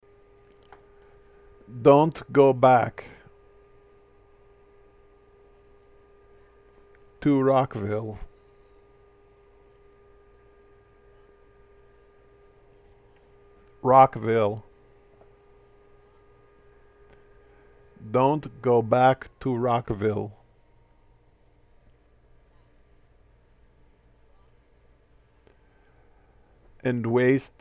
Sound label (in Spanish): coro